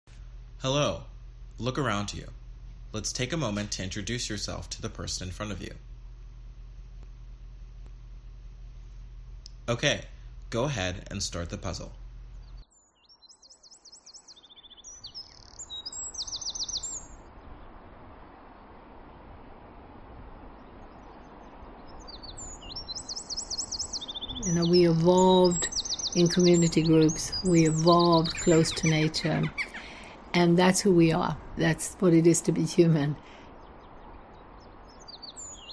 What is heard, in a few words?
Collaboration Soundscape; survival of the fittest